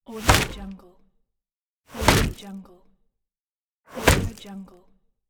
bullet hits wood

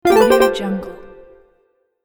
updated notification

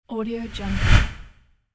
air swosh

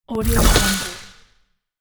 mechanical set up

